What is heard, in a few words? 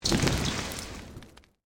Created Magic Spell Fireball Impact; Series of created spell sounds